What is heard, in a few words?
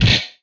Gun; MMRetroArcadeSoundsPack